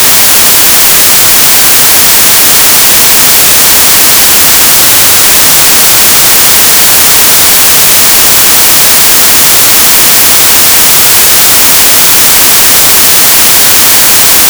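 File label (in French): bruit de parasites visqueux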